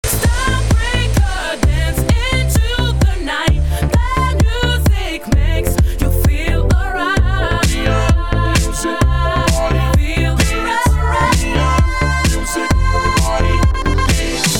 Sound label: remix